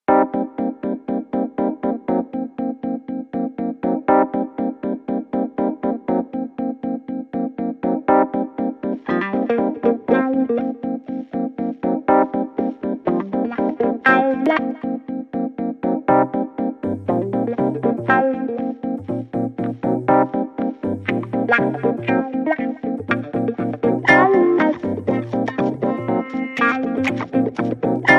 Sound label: Keys Guitar Flute Only